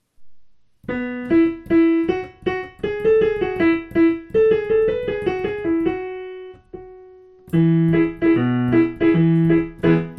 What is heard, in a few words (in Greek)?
kalanta xristougennon moraitika piano